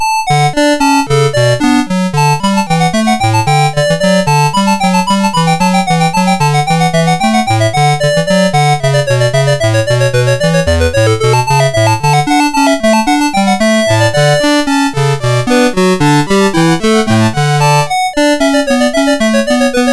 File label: Allegro, MOS6581R4